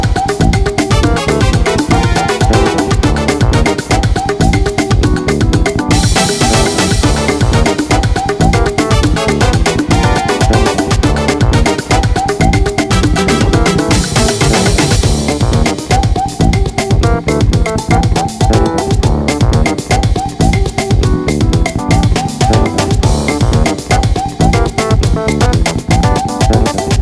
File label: Bongos